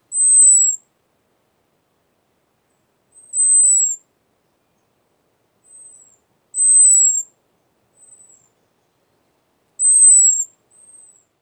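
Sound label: American Robin high seee hawk alarm; MI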